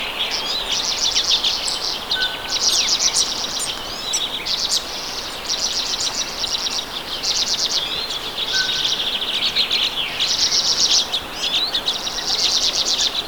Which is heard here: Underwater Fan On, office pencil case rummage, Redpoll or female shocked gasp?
Redpoll